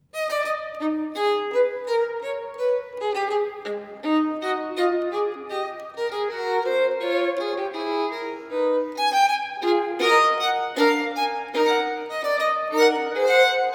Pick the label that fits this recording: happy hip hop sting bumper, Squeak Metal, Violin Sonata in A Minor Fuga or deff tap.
Violin Sonata in A Minor Fuga